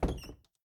Hiding Spot Exit; The Town

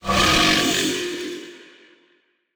Necromancer Attack Cast; portal quest android

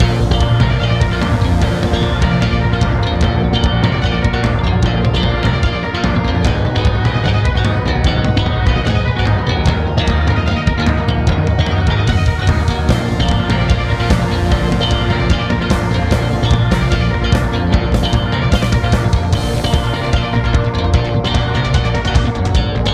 Boss Battle Bass Guitar Ver.; Octavo Boss Battle [Bass Guitar Ver.]; Cadence of Hyrule

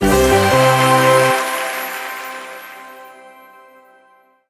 end victory; the sandbox evolution craft; android

